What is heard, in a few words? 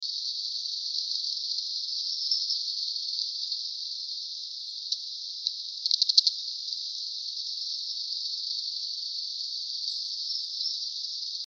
Dolphins